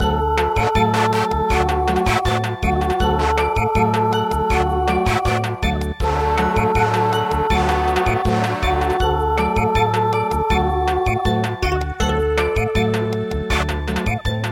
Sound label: Samba, midi; A Sing Sing So